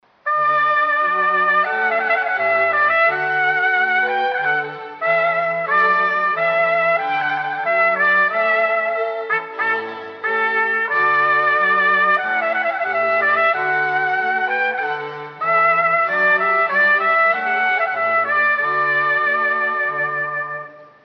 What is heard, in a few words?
Trumpet & Strings